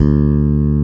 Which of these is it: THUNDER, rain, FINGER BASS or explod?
FINGER BASS